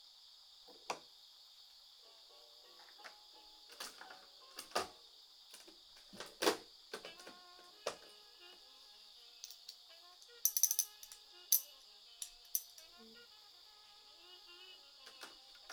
時計 修理; はこさん; ループ可音源

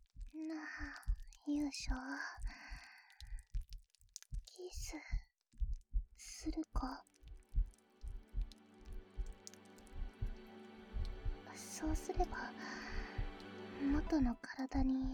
心音添い寝; なあ勇者、私とキスするか?

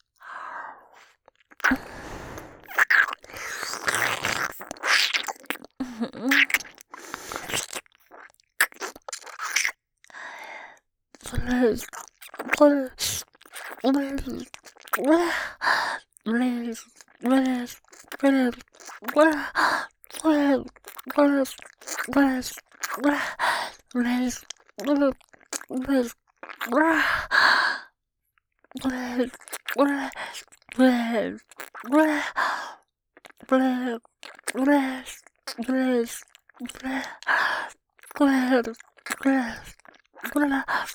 右耳フェラ; 激あまドエロなママショタ生活♪; 豚骨ウェーブ; バイノーラル

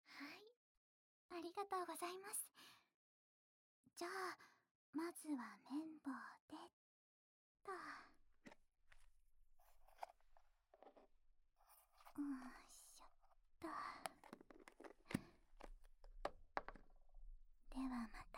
ゾクゾク 耳かき 体験 左耳

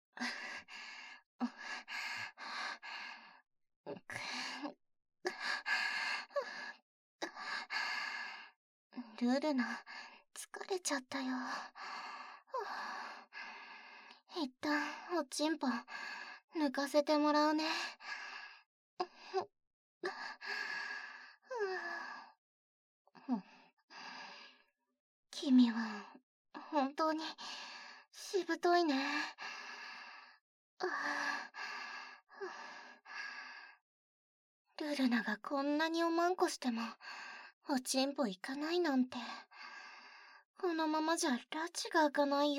左右 “同時” 耳舐め; ＳＥ無し

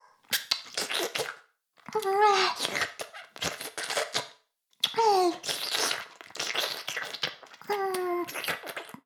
フェラ音声; 古き城のドラゴニート2

